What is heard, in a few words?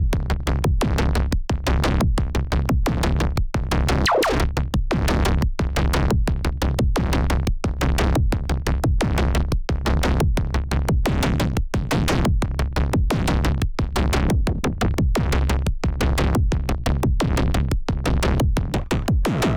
drums